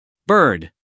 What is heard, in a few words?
bird